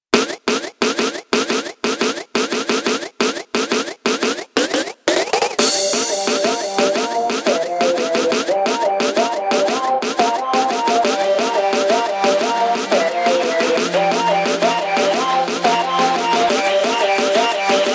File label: Elektronika DnB, loops; sampletank3cs